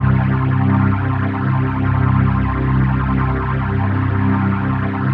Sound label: PHASE PAD, ATMO PADS; Best Service; XX Large